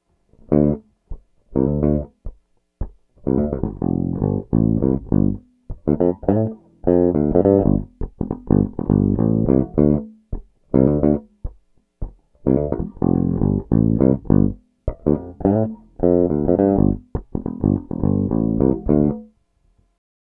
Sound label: Bridge Pick Tone DOWN; Super Neo